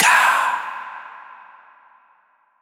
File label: gaAAHhh, vocal